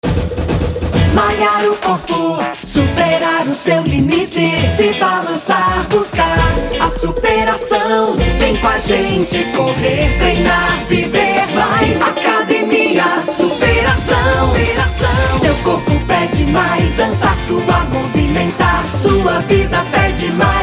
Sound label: jingle